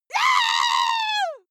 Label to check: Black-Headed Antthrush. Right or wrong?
Wrong